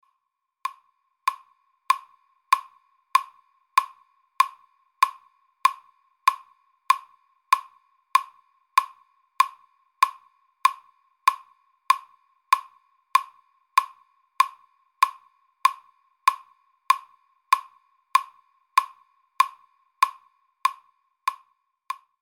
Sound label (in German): puls metronom